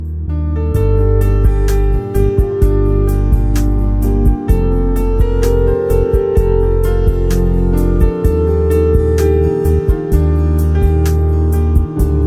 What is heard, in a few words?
Instrumental Tone